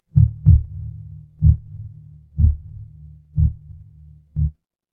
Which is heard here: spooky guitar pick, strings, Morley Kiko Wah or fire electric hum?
fire electric hum